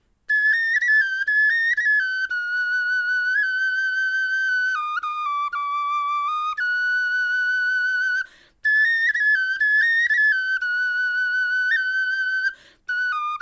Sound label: melodie, knochenfloeten